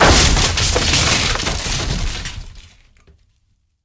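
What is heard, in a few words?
deafening wooden crash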